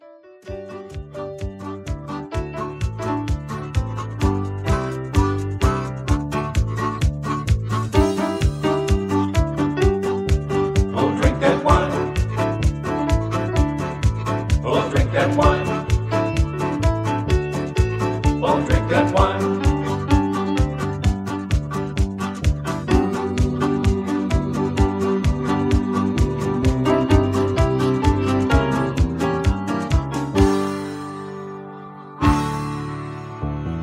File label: instrumental